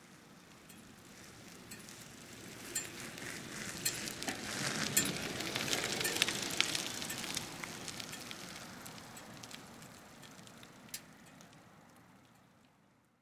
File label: Bicycle Retro Cruiser By Med Pedaling; Bicycles; Vehicles